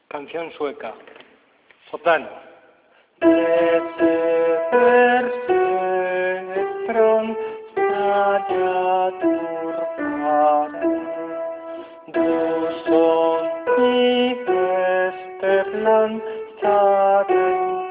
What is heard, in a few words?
soprano